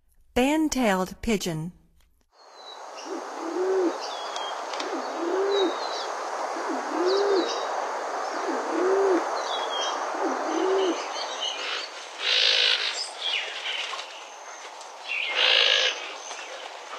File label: Band-tailed Pigeon; North America; Stokes